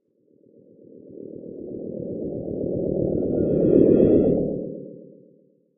Space Ship Pass By; spaceship fx

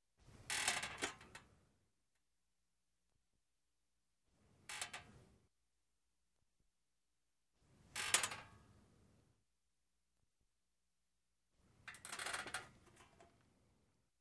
Chair Squeaks Metal C

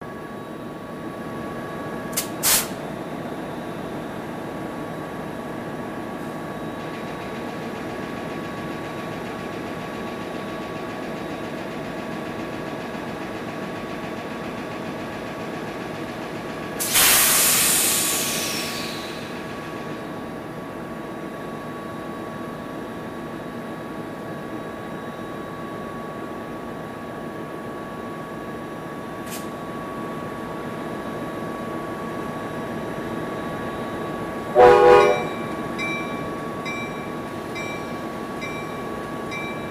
Train Idles Air Blast, bys

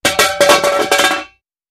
Hubcap Manhole Cov, crashes

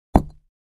Patio Block Impact, concrete & brick

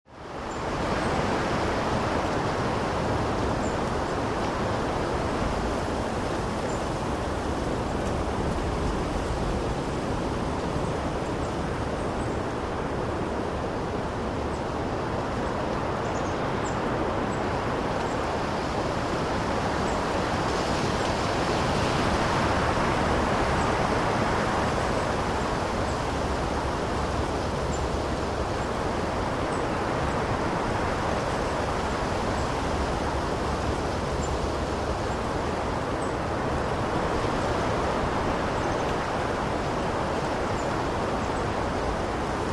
Rural Ambience, trees & gusts

